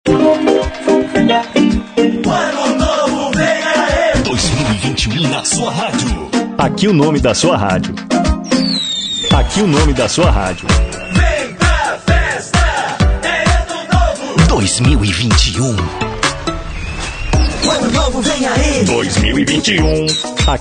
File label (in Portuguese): vinheta ano novo